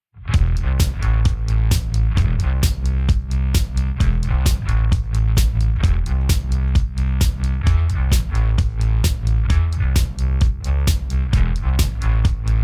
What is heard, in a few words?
Drums